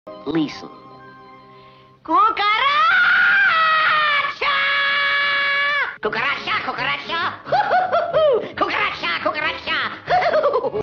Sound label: Cucaracha Meme sound effect